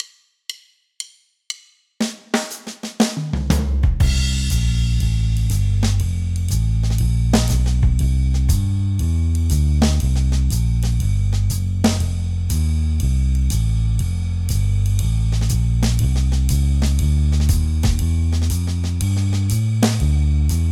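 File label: blues in C jazz blues swing without harmony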